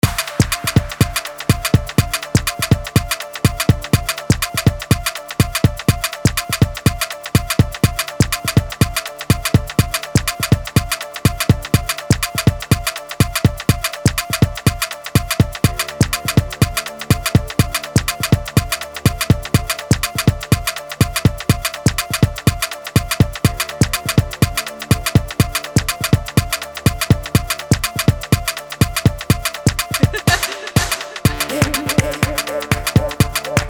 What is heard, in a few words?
Rainforest Spiritual Afro Drum